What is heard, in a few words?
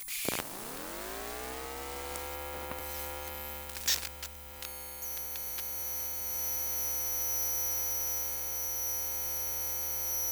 Electromagnetic Nuc On; Mono; Essentials Series NOX SOUND